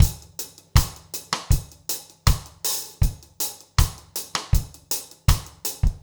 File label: reggae 80